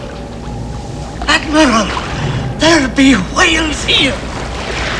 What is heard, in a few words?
whales; when the whales are beamed aboard the ship